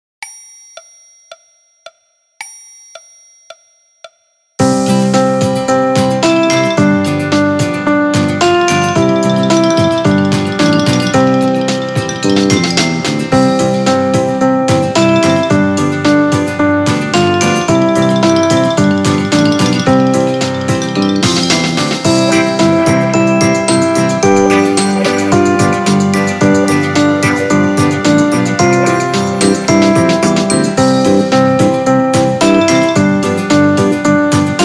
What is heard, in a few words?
Panda 2 Piano Orch; پاندا